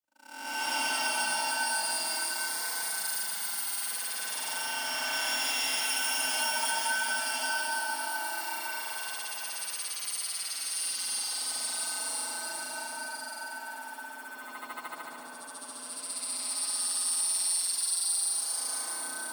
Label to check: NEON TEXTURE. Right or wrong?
Right